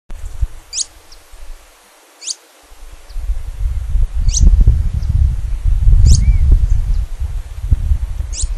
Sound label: Asthenes pyrrholeuca; Ruta 71